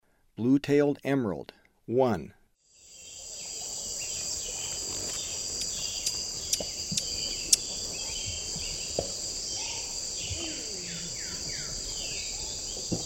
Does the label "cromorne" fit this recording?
No